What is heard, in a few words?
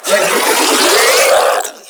Infested Ancient Melee Mid Spin Scream